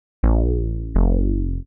HOUSE BASS 125 A

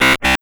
TECHNO EXTRA 140

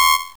HI HAT TECH ANNOYING; KillerTweaksII; DirectWave

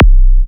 Minimal Kick, Drums, Kicks

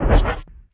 One Shot, Hits

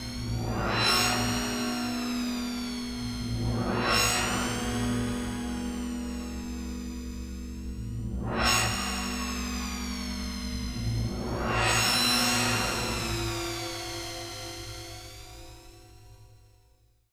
SPACE SHIPS; SpaceSounds

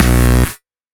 synth shot B; Electro; Anthemic; synth shots

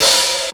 JAZZ HHOP, jazz drumset

